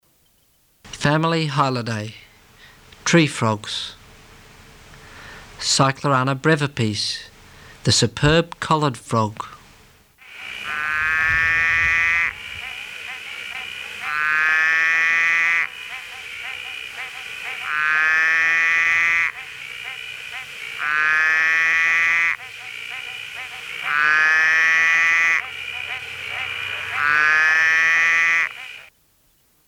Cyclorana brevipes

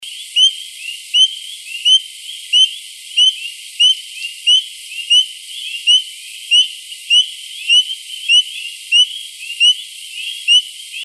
Boophis sibilans

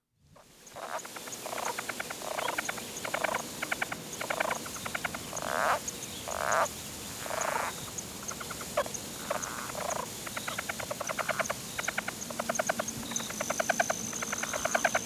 Rana yavapaiensis

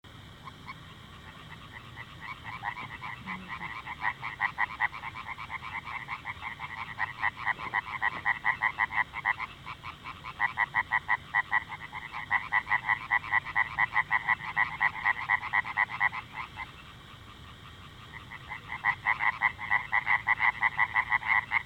Hyla orientalis

